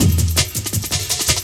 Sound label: Worries Break; Jungle; Breaks; Blu Mar Ten - Jungle Jungle - 1989 to 1999 Samplepack